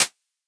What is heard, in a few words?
Clap Snare